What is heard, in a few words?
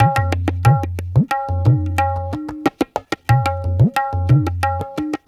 Tabla